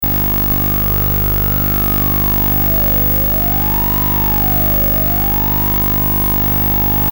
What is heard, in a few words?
Singing Camera